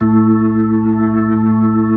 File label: B-3